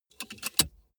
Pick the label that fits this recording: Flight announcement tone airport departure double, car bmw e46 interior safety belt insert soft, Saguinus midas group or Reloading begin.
car bmw e46 interior safety belt insert soft